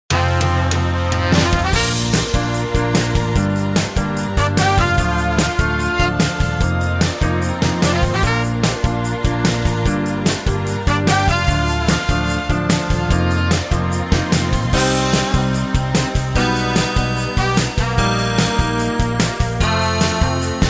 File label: Bb To C No Backing Vocals, backing tracks